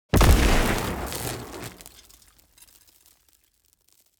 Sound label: environment zone electro explosion